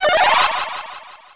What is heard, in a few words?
spellcast; undertale